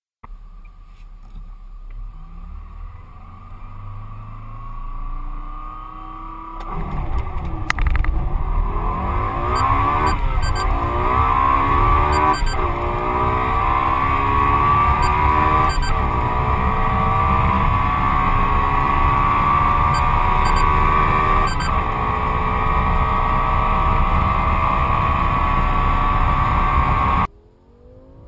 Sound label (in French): Harmoniques